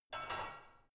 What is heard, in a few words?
barbell only replace soft